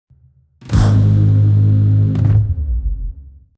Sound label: big win end add on; serengetikings